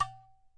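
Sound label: udu finger, Udu Clay Hand Drum; ETHNIC and WORLD PERCUSSION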